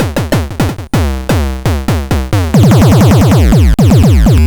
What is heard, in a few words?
Gameboy LSDJ Kicks; Nintendo